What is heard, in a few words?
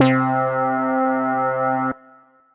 Light Horn Synth; Synth Lead; Ableton; Factory Packs; Chop and Swing